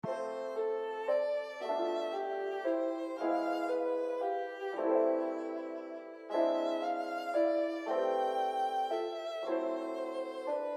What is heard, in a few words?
Waltz